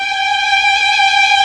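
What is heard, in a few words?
MINOR TRILL G3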